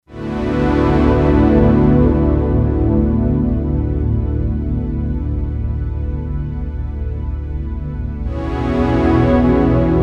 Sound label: Ancestral, Roland SC-880; Factory Patches